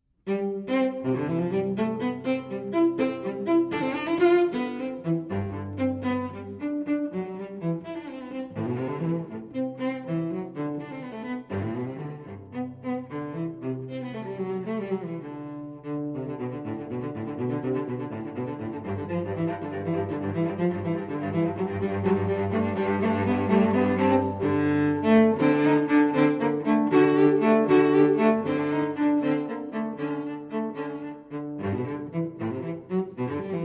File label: Cello Suite in C Minor Gigue; Cello Suite No. 5 in C Minor, BWV 1011; VI. Gigue